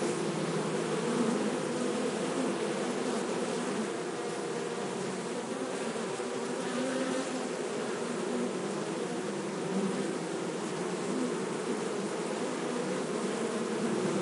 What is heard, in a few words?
Bees Defensive